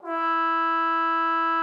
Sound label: TROMBONE, E4; Xsample VOL-3